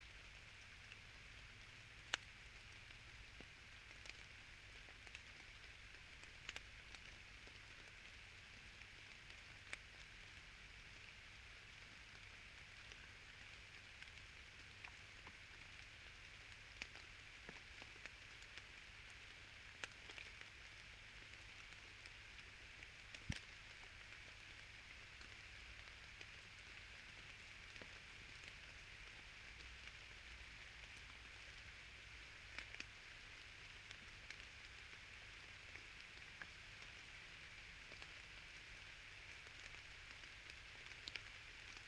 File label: yamanakako sound